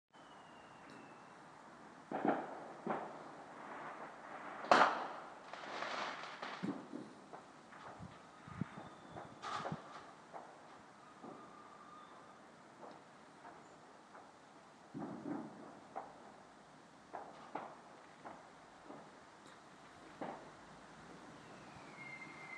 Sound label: firework bangs